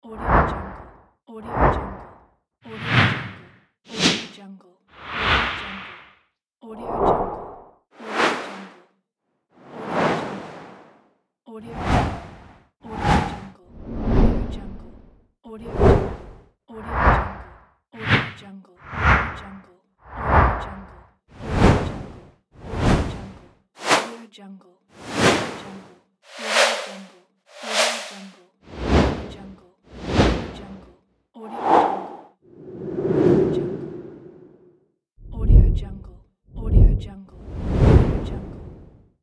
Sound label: woosh pack